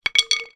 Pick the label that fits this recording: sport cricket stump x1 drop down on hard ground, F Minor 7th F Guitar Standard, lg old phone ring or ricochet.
sport cricket stump x1 drop down on hard ground